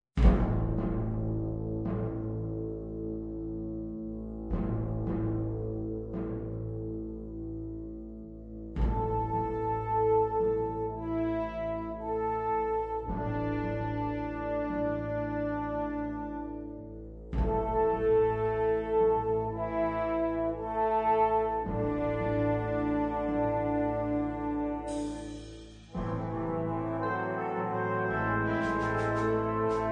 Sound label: Ensemble brass and percussion